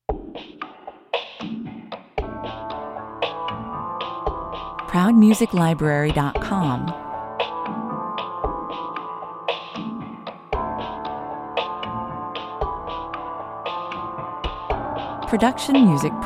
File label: piano and groove